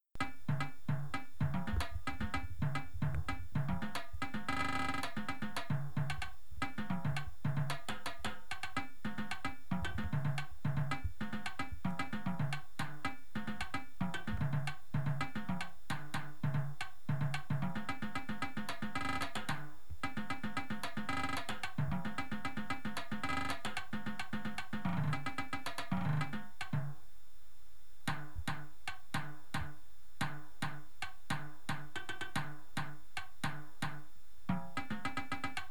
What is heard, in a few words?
tenors